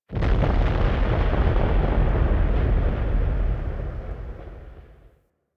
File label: Gas City Distant Cloud Clap Explosions, Ambience; Warframe; Corpus Gas City